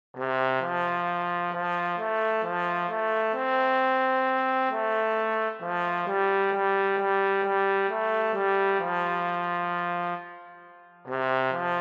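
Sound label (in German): solo Ich geh mit meiner Laterne, Posaune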